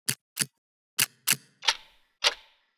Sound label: Standard Clicks